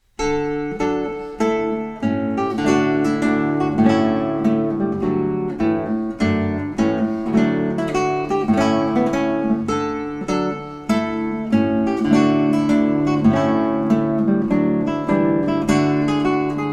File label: Trumpet Tune, Classical-Guitar; Purcell